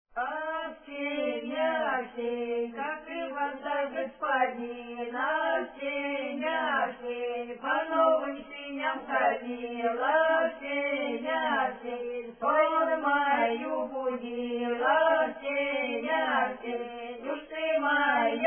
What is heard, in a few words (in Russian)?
протяжная, с.Ласино касимовского р-на; запевает; Ты мой молодой